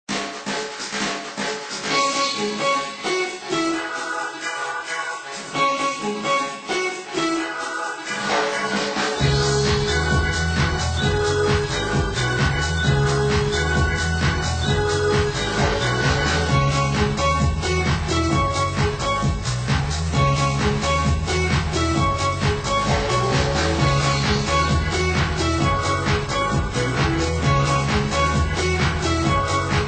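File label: Music Ambient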